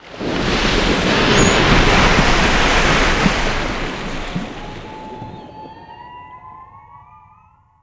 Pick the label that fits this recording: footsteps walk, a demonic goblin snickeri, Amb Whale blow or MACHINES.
Amb Whale blow